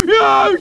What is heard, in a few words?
scream; homer; simpsons